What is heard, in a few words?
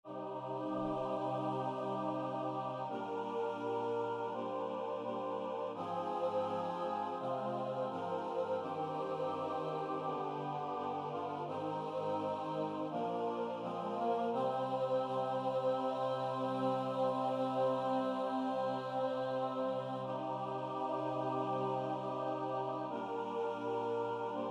tutti, Choral Music; du vertreibst alle qual